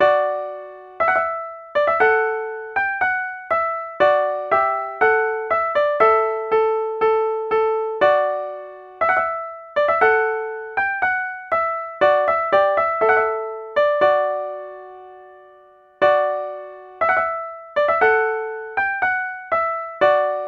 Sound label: Trumpet Voluntary Solo; Jeremiah Clarke